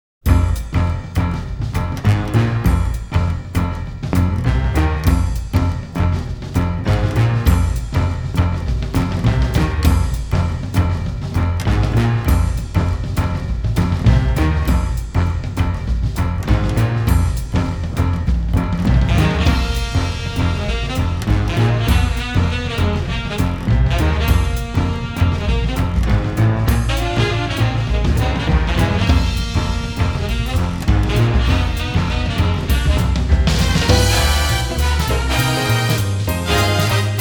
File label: Jazz Mix; Namco Video Game Graffiti Volume 9; Rolling Thunder 2